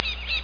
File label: deer question